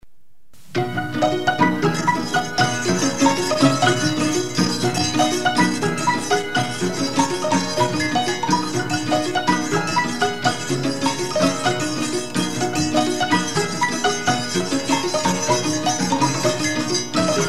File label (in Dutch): cahi orgel tumba